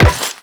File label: Run Metal, Footsteps; Warframe; The New War; Characters; Kahl